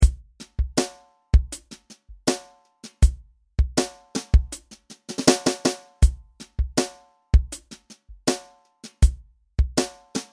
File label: Moar drums